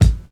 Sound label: fresh prominent kick drum single hit A key